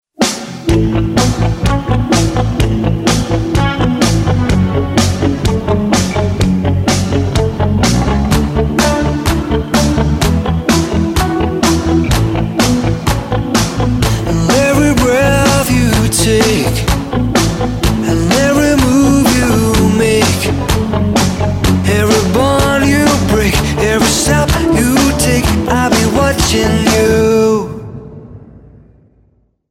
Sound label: electro remix